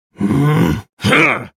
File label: spell cast effort; VarusAscended